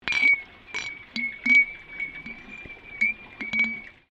cymbals Tibetan strike submerged pool, Tibetan hand cymbals played underwater; Musical